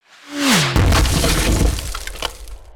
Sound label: maelstrom ship hit